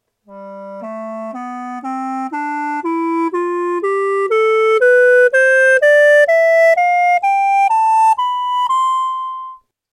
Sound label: claviola scale diatonic